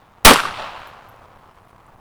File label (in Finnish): laukaus